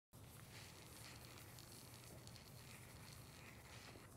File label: notepad sounds